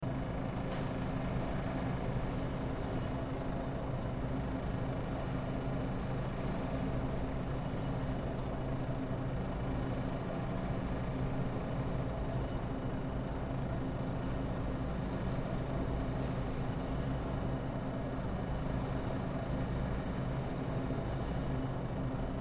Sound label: Store Nursery Section Generator Loop Business; Stores And Mall; Ambience